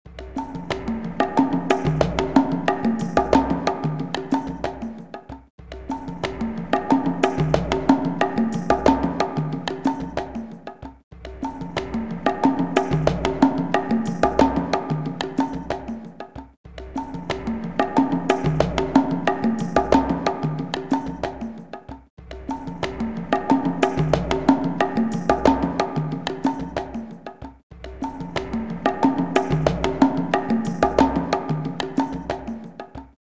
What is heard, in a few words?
Drumming